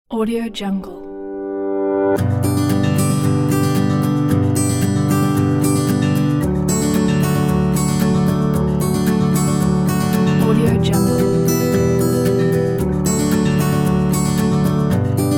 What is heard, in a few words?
acoustic inspirational